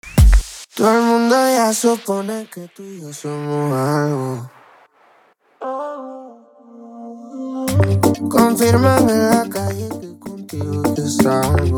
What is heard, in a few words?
open afro 128bpm